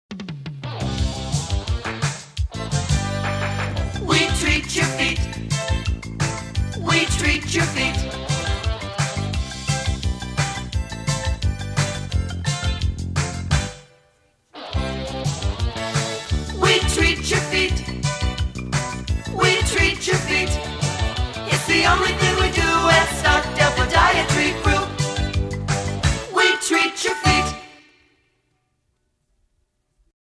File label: Jingle; Stockdale Podiatry Group; Bakersfield, CA